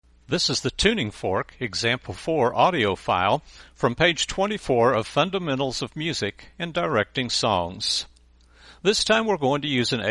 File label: Tuning Fork